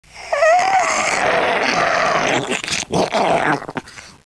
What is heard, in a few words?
spitter idle, zombie idle